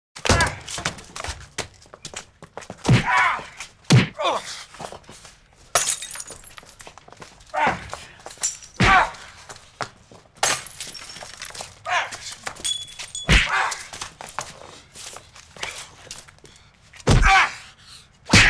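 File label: fight 2 men in alley; Parkour; Butterfinger Video Sound Effects; Additional Parkour Sounds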